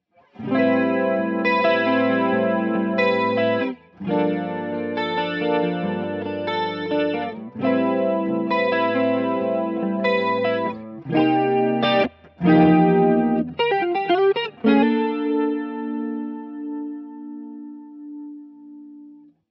Boss DC 2 Mono

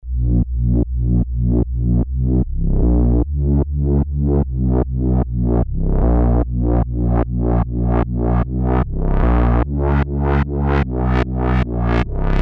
dubstep bass; ms20m

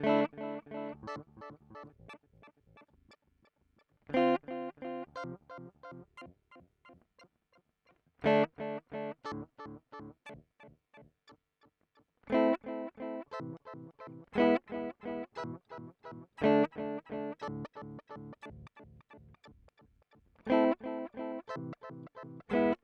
Meris Polymoon Late Modulation

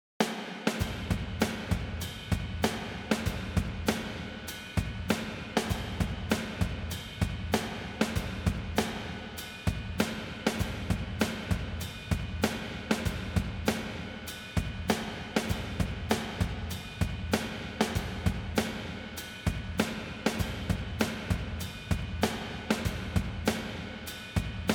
70ties Kit Squish 20 Drive 20 Gold 100; Kush Goldplate

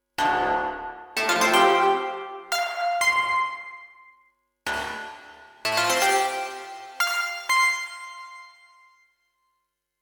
modal; logidy epsi

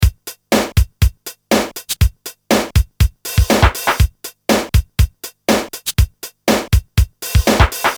Loop unprocessed

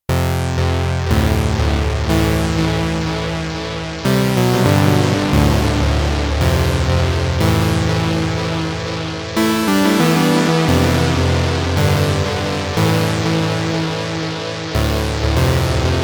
SEM Bass TAPE ECHO

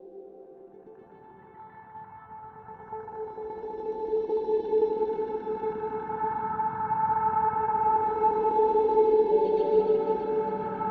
Meris Polymoon Reverb Sound

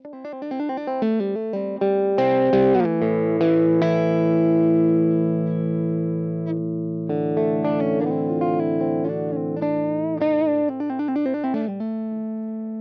Single Coil; Cort KX-500

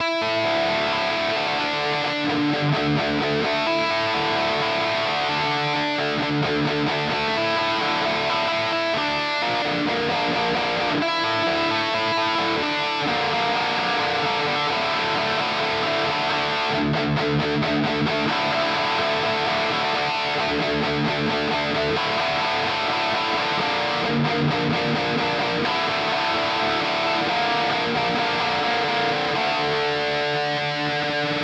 Harley Benton DNAfx GiT Amp Mark III

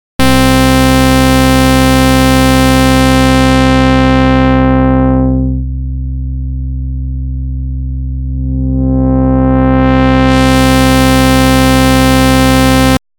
SH-101 Filter; Roland Cloud

